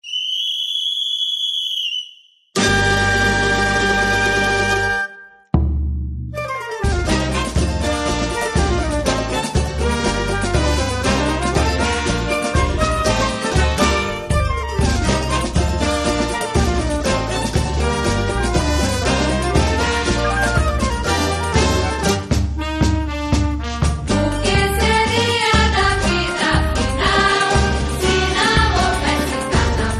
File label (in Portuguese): frevo de bloco; sampler frevo